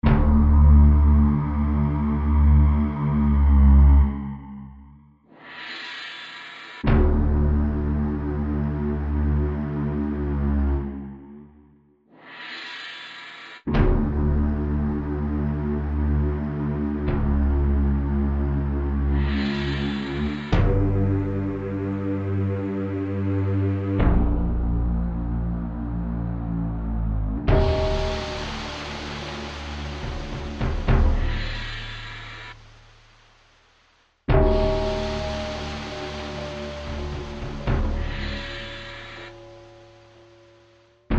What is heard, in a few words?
Dungeon, music